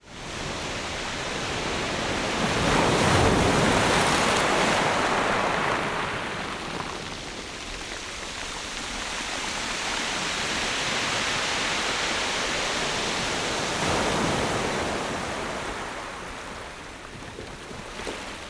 ocean waves break on shingle stoney beach plane pass distance